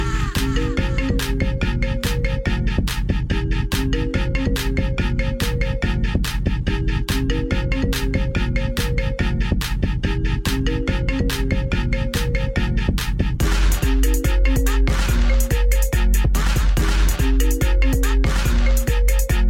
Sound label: ios alarm